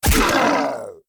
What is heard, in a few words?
monster